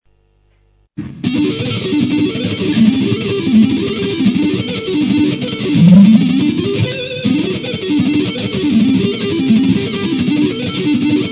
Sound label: sweeping on the edge, Exercices de Guitare